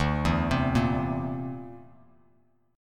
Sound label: D 7th Suspended 2nd Sharp 5th D, Bass Standard